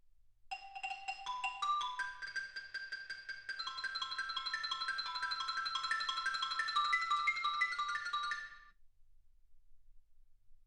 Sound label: xylophone